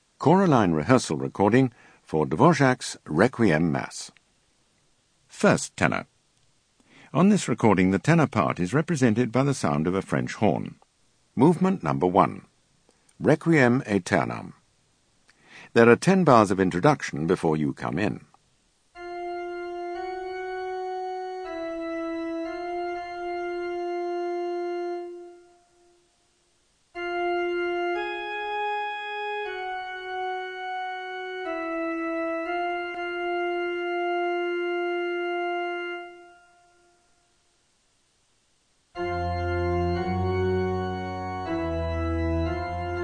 Ten 1; Dvorak Requiem

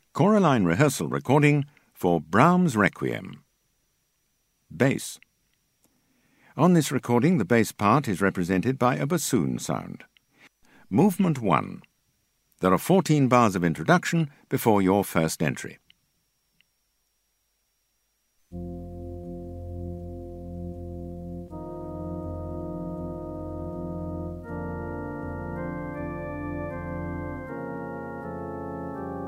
Brahms Req Bass

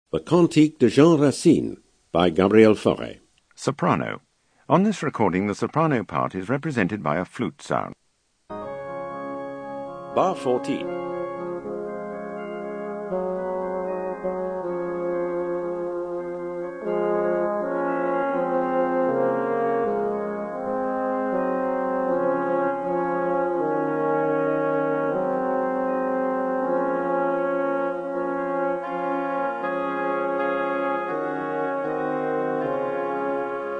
Sop Alt; Faure Cantique de JR

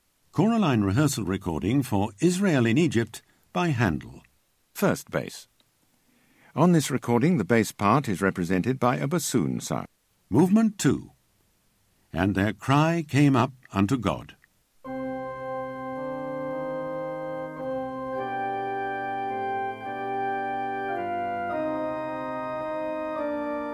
Bass 1; Rehearsal; Handel Israel in Egypt; ChoraLine

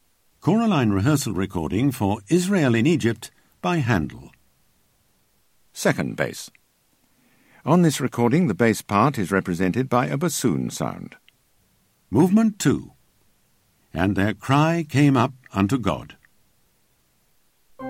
Israel in Egypt - Bass 2; Rehearsal; Handel; ChoraLine

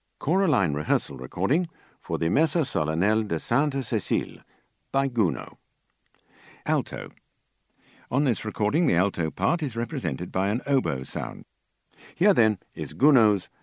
Alt, alto, Rehearsal; Gounod MS; gounod-messe-solennelle-de-st-cecile; ChoraLine

